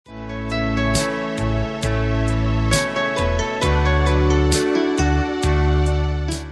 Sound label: acoustic guitar